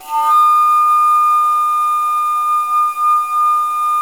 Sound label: BASS D#4, BASSFLUTE; USB Soundscan vol.23